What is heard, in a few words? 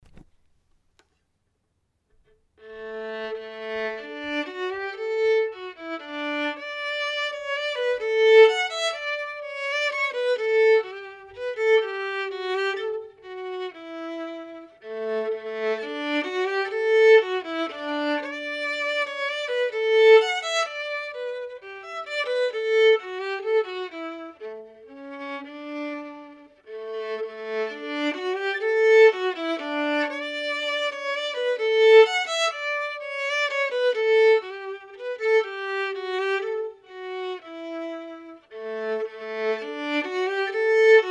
waltz in D